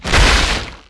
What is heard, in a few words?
airboat boss die